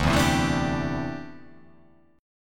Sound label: D 7th Suspended 2nd Suspended 4th D